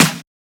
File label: Snare; FLPRO